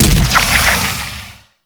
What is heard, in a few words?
Fist Impact Flesh; Warframe; Mummy